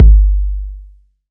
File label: fluffy kickdrum sample g key; Duration 0:01; Bitdepth 24